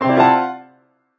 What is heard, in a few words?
Fail